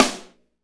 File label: snare, R&B Snares, Roland; Northstar - Drumscapes Roland